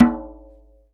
djembe, drum machines

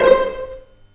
PIZZ C4

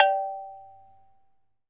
HIGH CUP, Cameroon; AKAI S6000 CD-ROM - WorldSounds; CAMEROON PRC

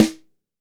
YAMAHA SNARE

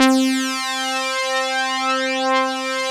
DS8 C 3, Korg

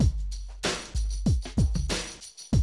Classic DrumLoops

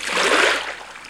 ROW, Boat Rowing

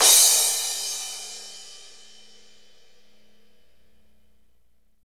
cymbal crash, Roland; Northstar; Drumscapes Roland; Cymbals 1

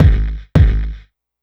Tek Kick; Sonic Foundry (Sony Creative Software); Electronic Point Blank

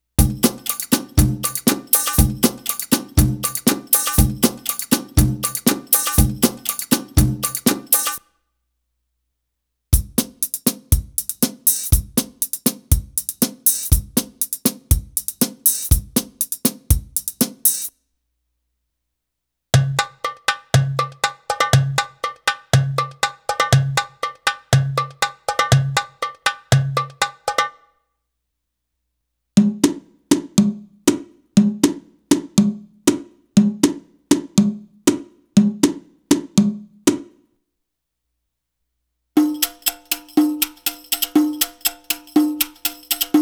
Arabic Rhythms

